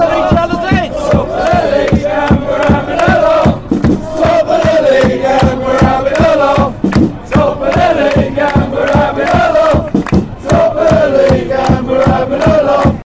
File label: top league and we're having laugh, fan chants